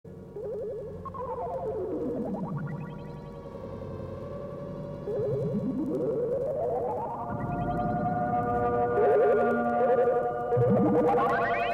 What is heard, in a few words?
Noise Electronic Music